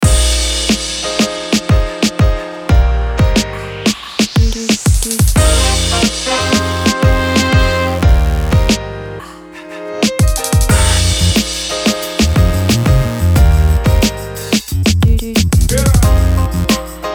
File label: Beans and Beats